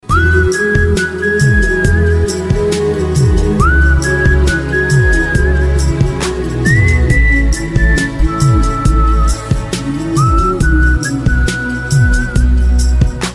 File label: Whistle